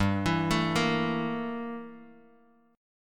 G Minor 6th Add 9th G down Bass Standard; Gm6add9 arpeggiated; JGuitar

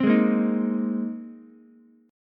C Minor 7th Sharp 5th, Ukulele; strummed; JGuitar

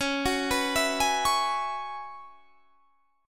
F sharp 7th Suspended 2nd Suspended 4th, C sharp

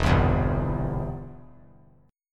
E Minor Sharp 5th, chord; Em#5 strummed; JGuitar

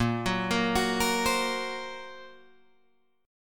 Bb Suspended 2nd Sharp 5th, Guitar Drop D

